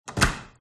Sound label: close door freezer; fox audio